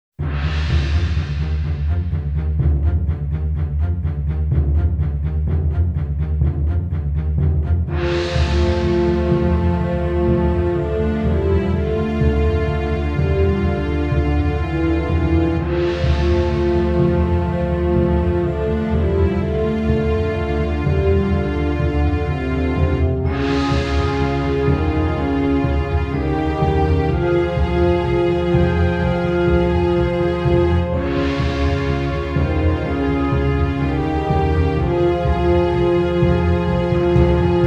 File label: Final Battle; Jeanne d'Arc